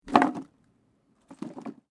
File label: WOOD PLANK MOVEMENT